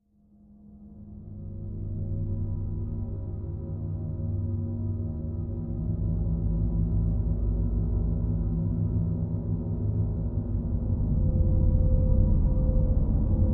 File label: Drone Eerie Deep; pads